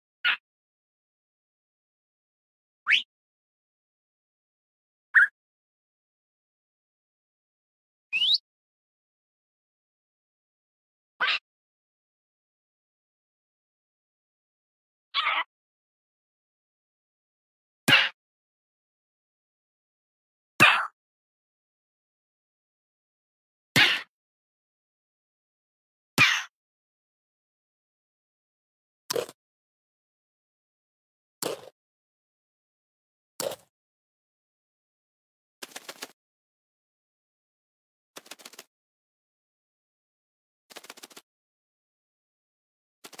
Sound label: Parrot; Minecraft; ماینکرافت